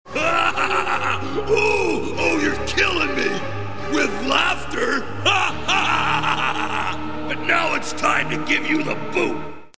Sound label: dying with laughter; megaman